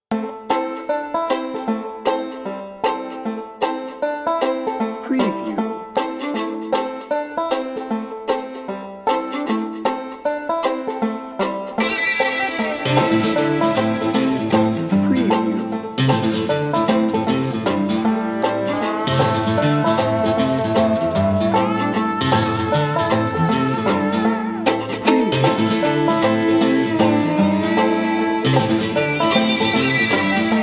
redneck hip hop no drums

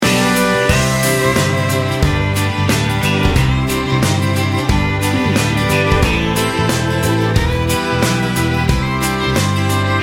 country road

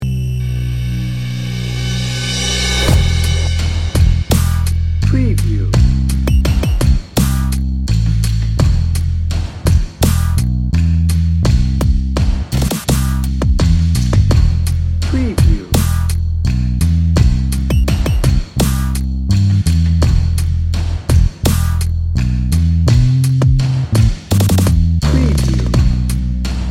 tragedy dnb

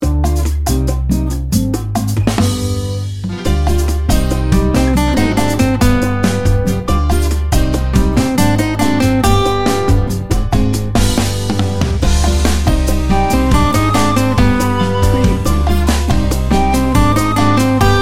cafe cubano

